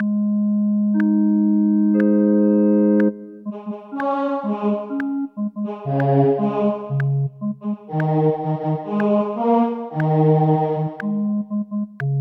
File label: O clap your hands; Gibbons